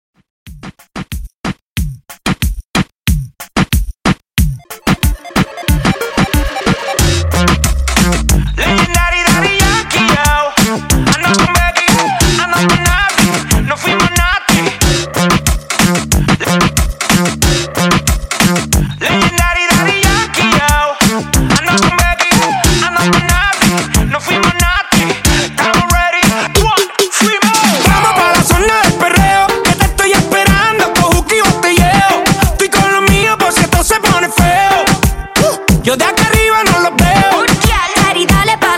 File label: Reggaeton 92 Bpm